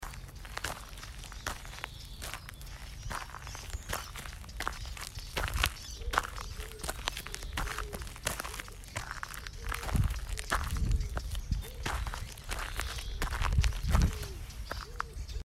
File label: Walk on the road